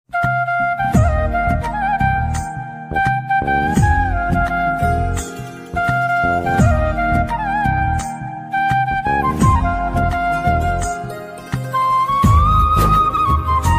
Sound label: flute ringtone hindi flute ringtone